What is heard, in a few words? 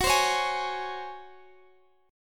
C sharp Major 7th Suspended 4th F sharp